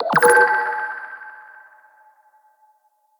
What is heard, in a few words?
Insect Med Station Pulse; Liset; Liset Powers